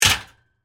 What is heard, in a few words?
Gate Close; Foley; Gates; BlastWave